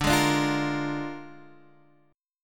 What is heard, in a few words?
Bb 7th D, chord